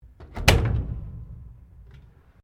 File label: Clothes Dryer Door Open, Domestic dryer; Laundry; Household